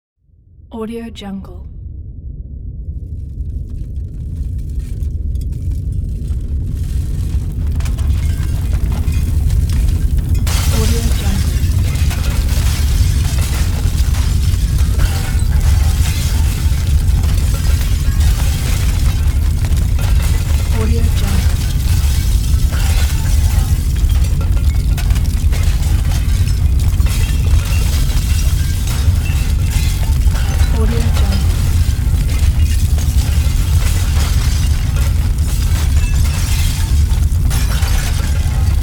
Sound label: earthquake with debris; game sounds